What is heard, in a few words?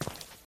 Footstep Rock